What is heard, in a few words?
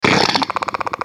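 damage, basilisk; basalisk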